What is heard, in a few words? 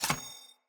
Bow Charged Shot Ready; Weapons; Josalfar Bow